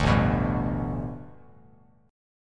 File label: A 9th Suspended 4th Bass Standard, chord